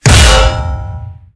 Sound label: tank hit med unpierce; tanks; War Thunder